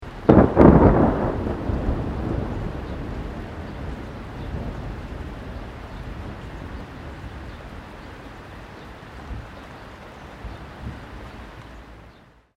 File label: THUNDER CLOSE LIGHT RAIN BIRDS